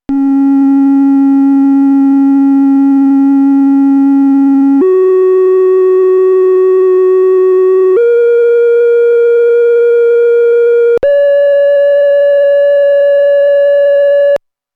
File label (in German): Korg volca modular Externes Tracking